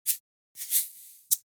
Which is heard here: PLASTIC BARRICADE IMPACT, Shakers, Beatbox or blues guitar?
Shakers